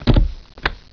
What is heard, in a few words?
STAPLER